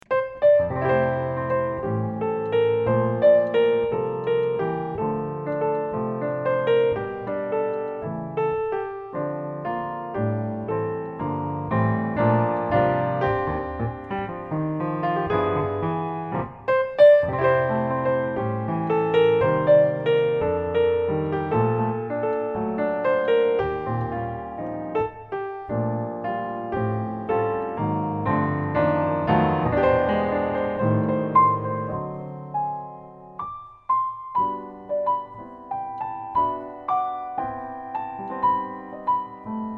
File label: Christmas Waltz